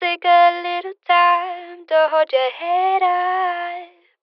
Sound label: Phrase Dry 70 BPM C Min, Vocals; Little Time; Cymatics - Eternity Sample Pack; Phrases